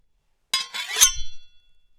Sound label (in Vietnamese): tieng mai sac ben ro rang cua giao kiem